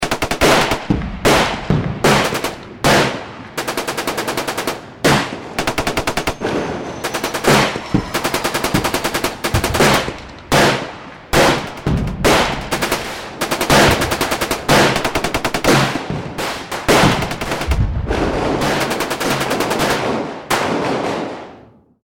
warfare battle intense urban, Battle Ambience (Modern); Battle Ambience - Modern Battle With Rifles , Machine Guns And Explosions; Battlefields